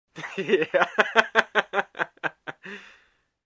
Grown Man Laughing

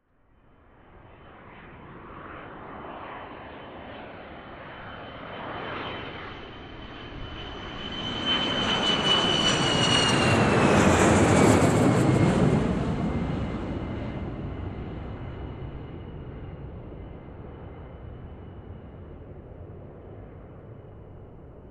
Airliner on runway taking off

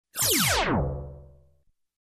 Futuristic Laser beam

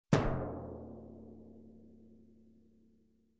Hard Single Timpani Hit

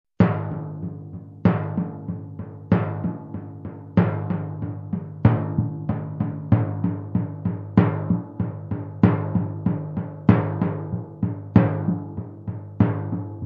Majestic Timpani Groove

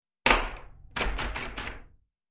Forcibly opening a locked door

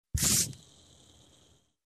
Bottle Cap open

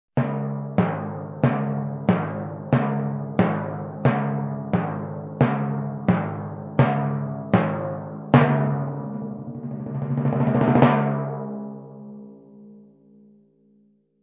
Fat man walking timpani groove

